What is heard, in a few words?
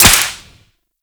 Whip Impact Default; Warframe; Grineer